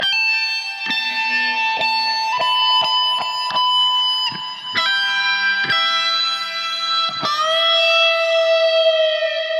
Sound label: E Phrase Soaring Guitar; Zero-G; Future Wave